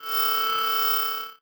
Science Fiction Sci-Fi Electronic Pulsing Scanner

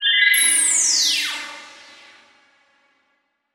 Title Transition Laser Long; Production Element

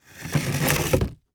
Foley Movements Empty Box Slide On Cement Floor

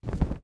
Cloth Drop Blanket On Cement; household

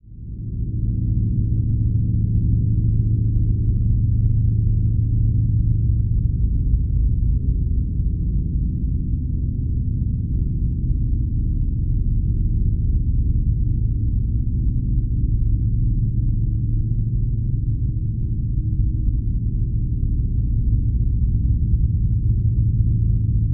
Drone Atonal Steady Constant Tone; Production Element Imaging; AdobeCC

